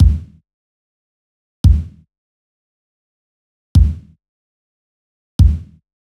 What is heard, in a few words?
drum kick one shot tight